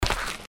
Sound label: step dirt, footsteps